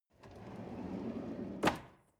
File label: drawer medium open draw